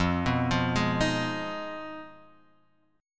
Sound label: F Minor 7th Flat 5th